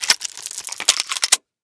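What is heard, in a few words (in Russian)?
профессиональная быстрая перезарядка оружия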